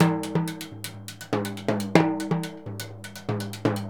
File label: TIMBALE, Percussion; ILIO; Synclavier Percussion 2; World & Orchestral